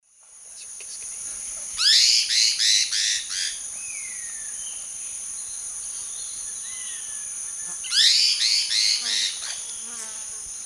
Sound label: Philohydor lictor, calls; S. America; Eastern Lowlands